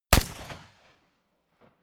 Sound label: rifle ak-47 exterior fire single clean bright; comedy cartoon; pistolen gewehre; Pistols and guns